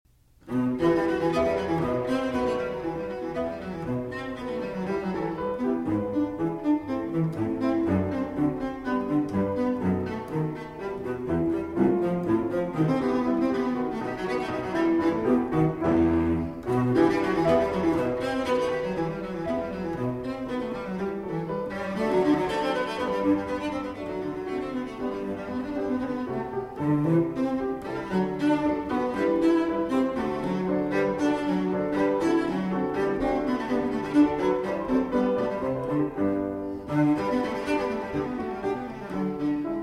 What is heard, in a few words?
Cello Sonata in E Minor, Allegro